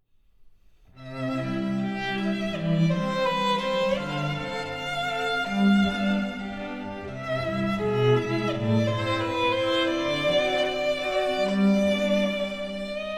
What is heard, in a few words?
Trio